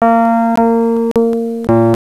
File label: ENSONIQ ELECTRIC PIANO